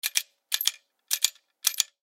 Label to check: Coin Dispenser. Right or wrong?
Right